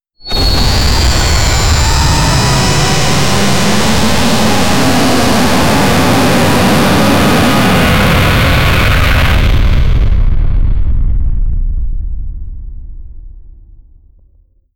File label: create the sound of a, explosive burst